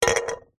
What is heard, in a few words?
foley can soda 200ml set down on concrete; zapsplat